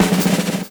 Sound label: DRMROLL; E-Lab; X Static Goldmine 2; akai